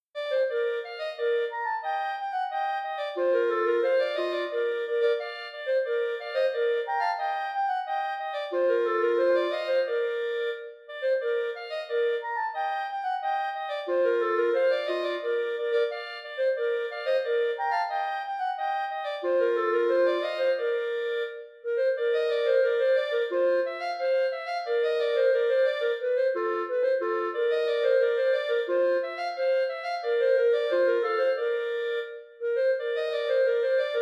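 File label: clarinet duet; duo no4; heberle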